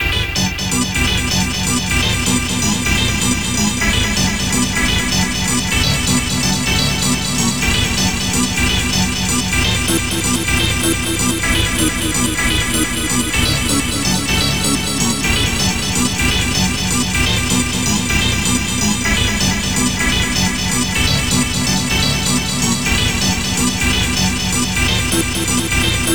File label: rave sequence; synth loops